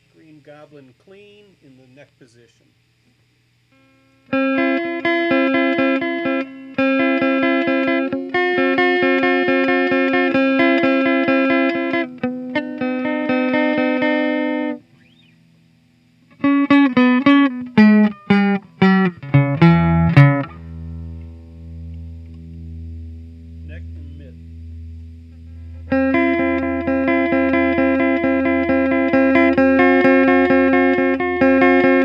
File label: Guitar Clean; Scott Spencer